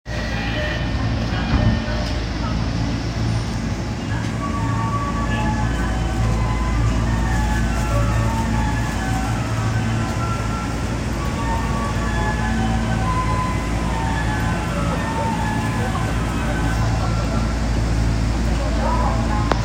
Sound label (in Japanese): 丸の内線赤坂見附西, train sounds